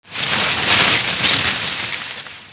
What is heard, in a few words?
leaves